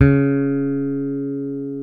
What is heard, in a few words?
BS P BASS, E.Bass; Roland L-CDX-01